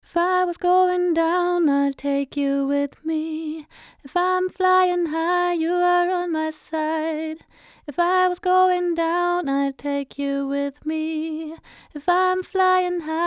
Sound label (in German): Leveler Vocal Parallel 6 zu 1; Fredenstein Artistic